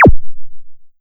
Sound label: transmission end